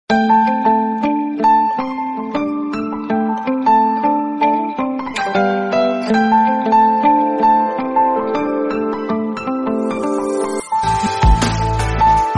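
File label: background music Romantic Ringtone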